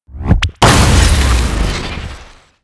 Heavy Tank Railgun; blue archive

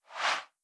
BG Scroll; blue archive

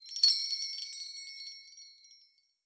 Bell; blue archive

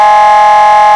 horn, sirens; whelen 295hsfa6

